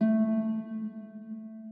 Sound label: harp